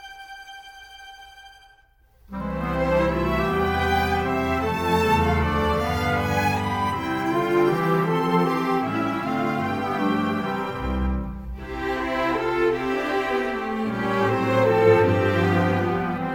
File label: backing; munchkinland